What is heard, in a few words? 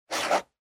Ballpoint Pen Circle